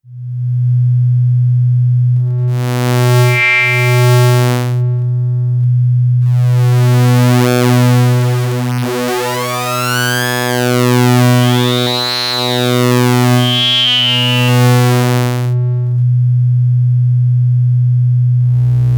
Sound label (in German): Anamono X mini OSC Type 1 SUBOSC Waves PWM moduliert; Gotharman